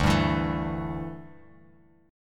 D Suspended 2nd Suspended 4th D down Bass Standard; strummed